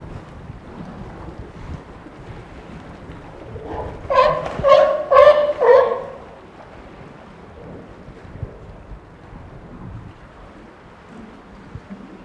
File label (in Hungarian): kaliforniai oroszlanfoka szelvedo; állathangok; nyíregyházi zoo 2010